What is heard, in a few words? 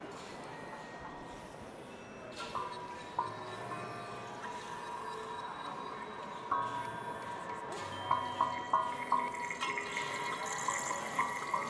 bells